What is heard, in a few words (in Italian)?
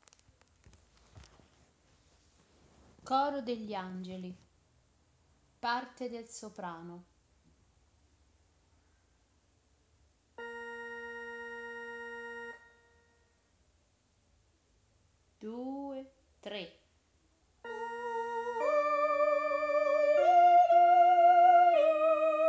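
Coro degli Angeli soprani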